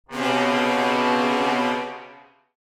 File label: Cartoon orchestral danger